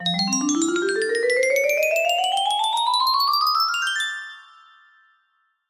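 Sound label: scale test, music box melody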